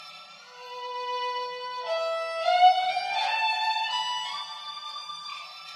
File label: Violin Legato; Teaparty; Angry Birds Seasons